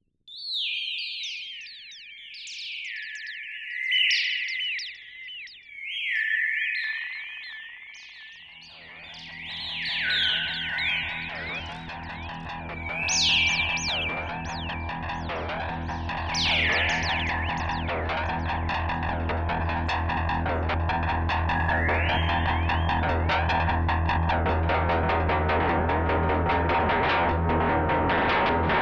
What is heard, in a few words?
electronic birds 3